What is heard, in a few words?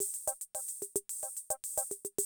CR78, DrumLoops